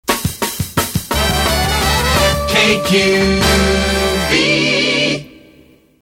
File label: drum in KQV